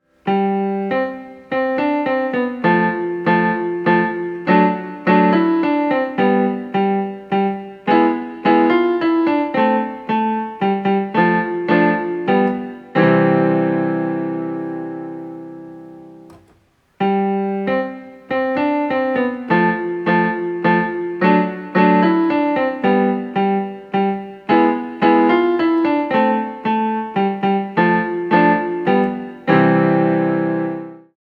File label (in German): We wish you a merry Christmas Klavierversion